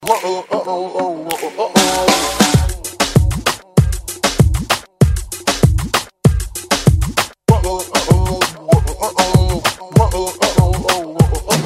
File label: awesome rington